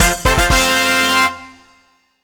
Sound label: Build Landmark Trumpet; machi koro with everyone; switch windows